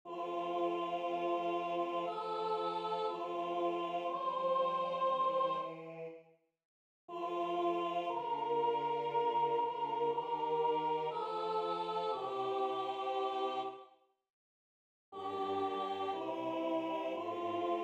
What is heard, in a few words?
Soprano; repertorio polifonico